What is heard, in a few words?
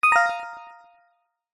game sound soft warm digital tone positive select